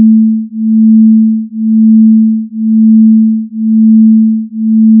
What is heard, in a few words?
stereophase